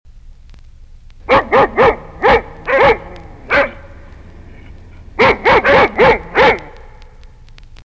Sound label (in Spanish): perro